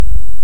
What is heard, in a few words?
Hydrophone; OneOcean